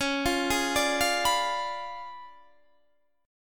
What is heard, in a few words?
Db 7th Flat 9th, Guitar, Standard; Db7b9 arpeggiated; JGuitar